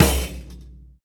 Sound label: Brazil Tamburin, Tamborim, Percussion; Roland BK-5